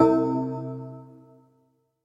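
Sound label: quiz sounds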